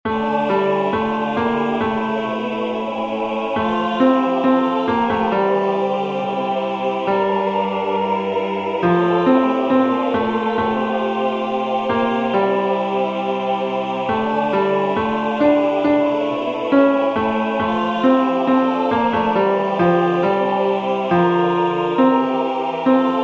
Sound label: tenor; Opieknosci